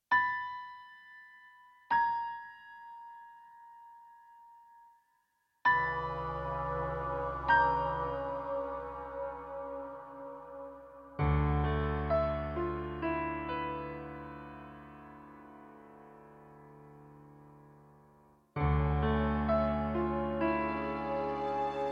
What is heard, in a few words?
haunted; halloween